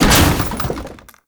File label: Common Military Crate Destroy; Objects; Storage Container; Warframe